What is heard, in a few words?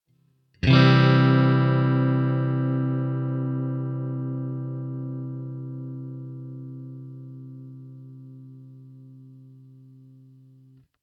C Major Chord Ringout